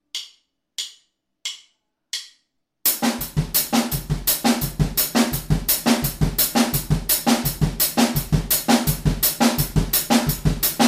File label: jungle ex